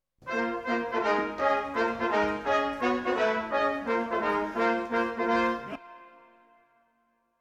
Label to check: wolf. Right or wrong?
Wrong